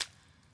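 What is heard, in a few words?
wet walk, footsteps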